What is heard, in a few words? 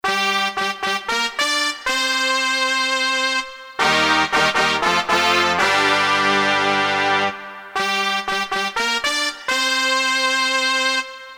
Brass, Korg DSS-1; KSDU-002 Brass